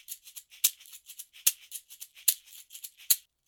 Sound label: caxixi